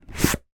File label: toy police helmet plastic uk style pick up from table